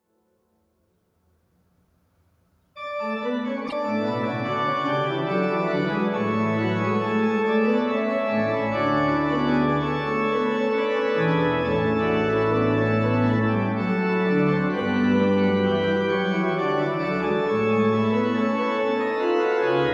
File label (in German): Kirche Orgel; Ev-Kirche-Kenzingen